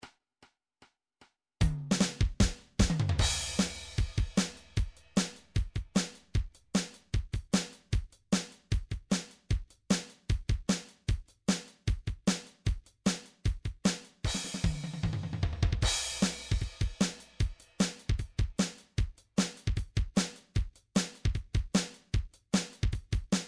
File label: soft rock 152 rock and roll; sonar